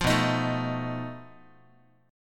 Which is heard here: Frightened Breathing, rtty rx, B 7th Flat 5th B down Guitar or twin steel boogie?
B 7th Flat 5th B down Guitar